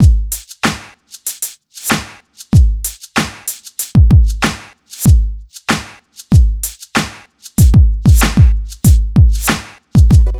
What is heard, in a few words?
095 Bmin, Hip Hop Exotica; Apple Loops; Big Fish Audio